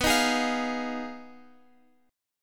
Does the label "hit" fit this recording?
No